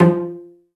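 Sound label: Cello Pizzicato F3; Digital Sound Factory Virtuoso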